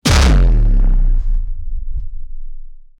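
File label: demonic legendary loot drop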